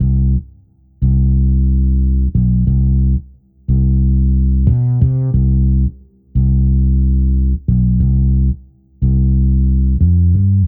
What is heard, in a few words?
Fretless; Сэмпл Bass (Chillout); 90BPM; Loops; БАС